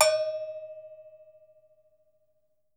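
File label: deep cow, right, Percussion; Miroslav Vitous - Percussion & Harp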